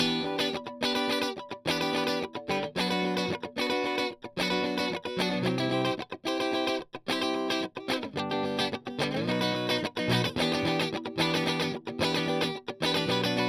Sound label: Strum